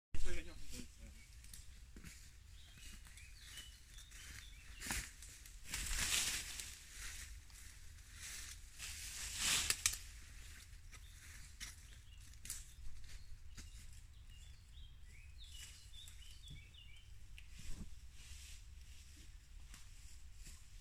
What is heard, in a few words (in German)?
aufräumen sturmholz; Modulkoffer